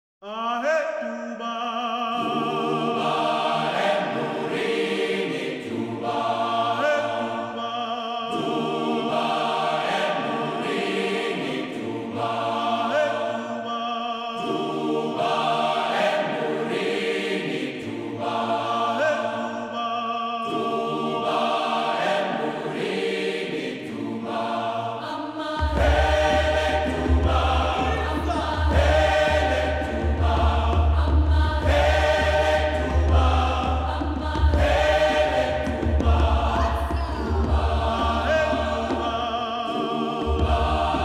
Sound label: Tuba